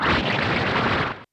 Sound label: knife throw